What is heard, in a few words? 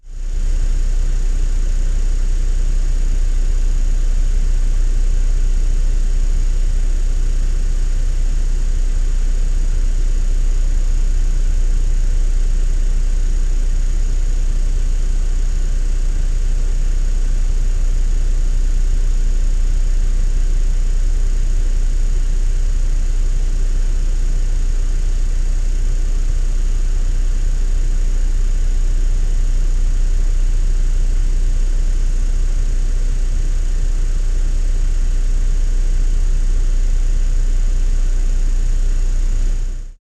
bus idle interior; transportation